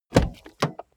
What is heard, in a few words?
car bmw e46 exterior door front open; Cars